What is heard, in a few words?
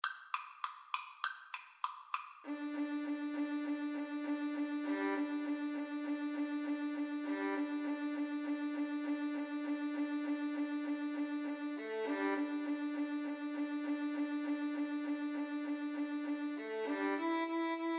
violin duet